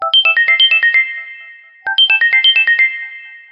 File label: Sms Ringtone; Samsung Galaxy S21 5G